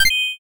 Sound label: notify follow